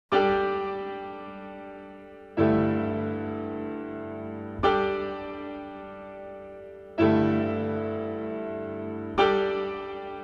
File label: bells of christmas